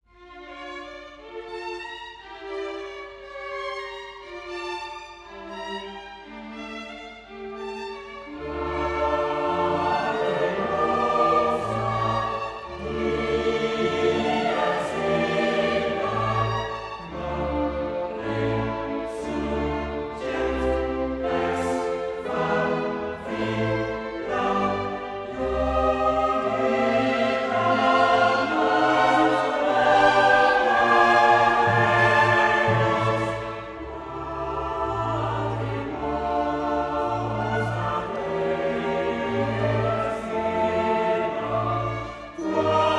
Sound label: Requiem in D Minor, K 626 VIII. Sequentia Lacrimosa dies illa; Mozart, Wolfgang Amadeus